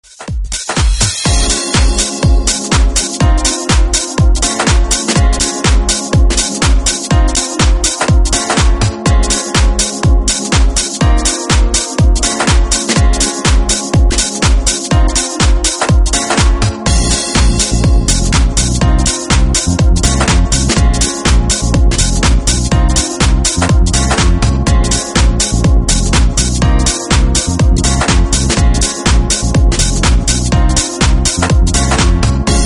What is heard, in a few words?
Soulful House Dub